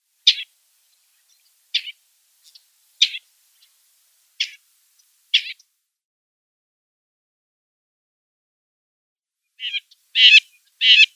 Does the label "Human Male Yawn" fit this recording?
No